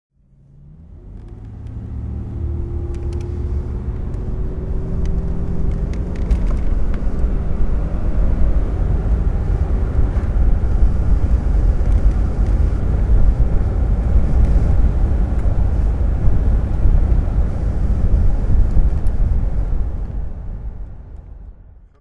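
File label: jaguar xjs 53L v12 conv driving, Engine sound driving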